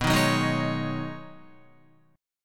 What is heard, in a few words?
B 6th Add 9th B Guitar, chord; strummed